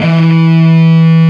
LONG SOLO E, HEAVY METAL; Greytsounds

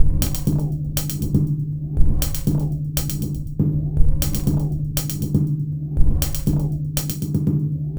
Minimalism, Processed Percussion

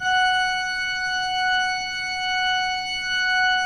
pipe organ, 3-D Audio

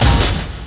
Revb Hit, Percussion Hits

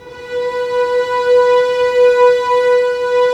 STR SEC, Roland LA Composer, Sec Mute St; Roland - LA Composer vol. 1

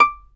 fiddle pizz D6; Sampleheads; Whole Lotta Country 1 & 2

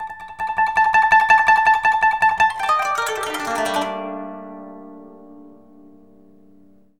KOTO ARP

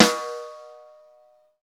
SNR, Roland; Real Snrs

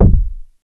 Moog BD; Moog Modular55

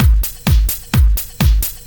Clubhouse Loop 128 BPM

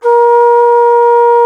T. BONSURI, India Winds; E-MU Producer Series Vol. 7 – Old World Instruments

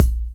BD; Best Service; Dance Mega Drums

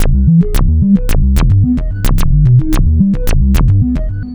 Bass Line; Eclectic Electronica

